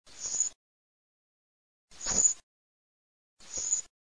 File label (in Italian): SASSELLO ZIRLO